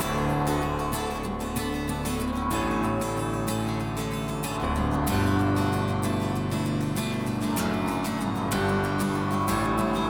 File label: Breakdown